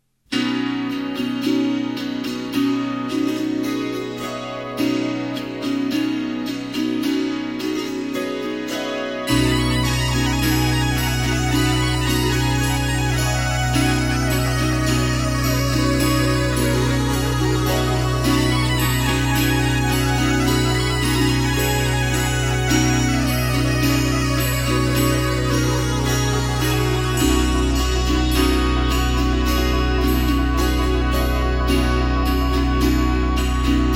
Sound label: bgm